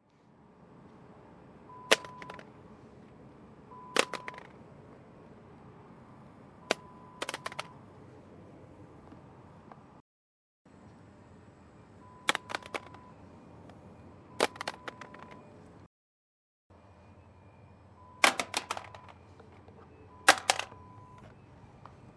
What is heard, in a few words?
Satnav Being Dropped